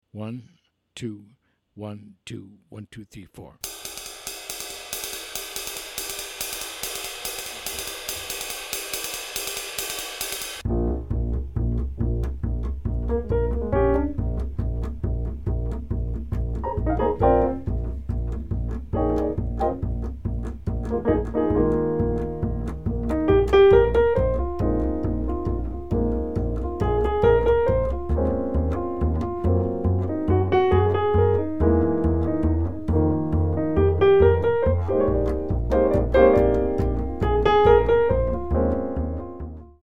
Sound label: minus Drums